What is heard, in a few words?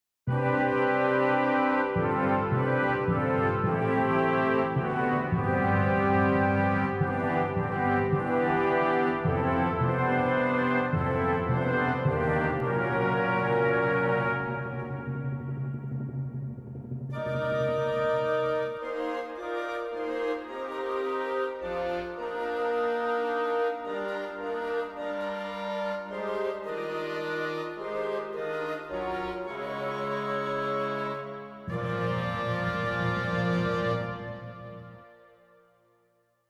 Fanfar